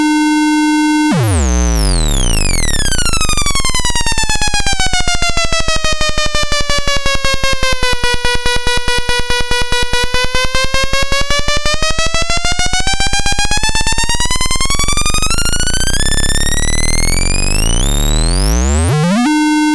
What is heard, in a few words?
SAA1099P variable clock